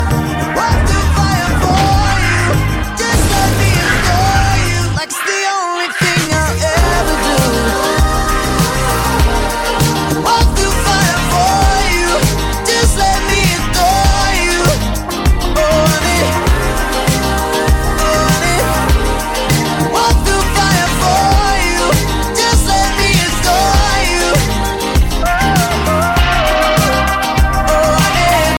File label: english music ringtone